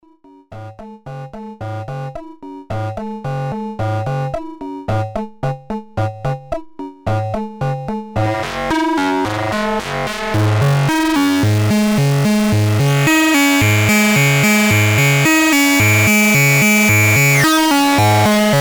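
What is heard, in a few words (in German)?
ACL 2 VCOs über Dual State Variable VCL auf VC Panning Amplifier Panning über QLFO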